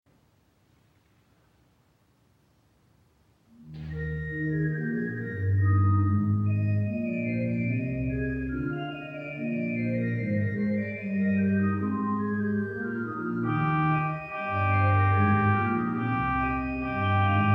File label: Organ Master Works; simpson